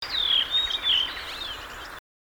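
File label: Turili, Redwing (Turdus iliacus); 13.5. Iso-Huopalahti Kuin F-G.; Espoon - Helsingin raja-alueilta alkukesästä 2008; Linnut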